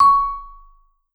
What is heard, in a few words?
Vibes, C#5; Sampleheads - Dave Samuels Marimba & Vibes